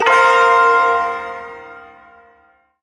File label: Shawzin Hirajoshi Chord; Hirajoshi Chord K; Warframe; Duviri Ersatz Shawzin; Chords